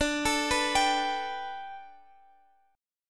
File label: D 6th Suspended 4th D, chord; D6sus4 arpeggiated